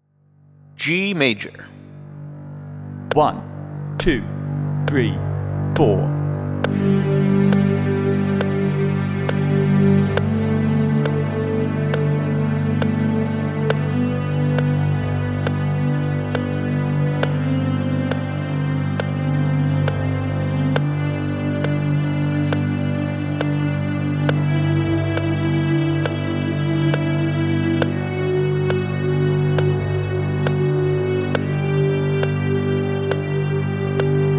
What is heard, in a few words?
G Major Scale with Drone; one-octave scales with drone; Automate and Motivate 21st Century String Players with Technology